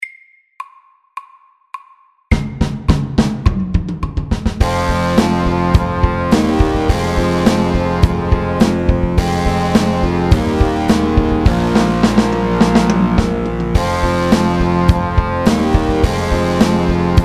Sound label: Heavy metal MEDIUM 105